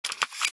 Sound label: reload end; war zone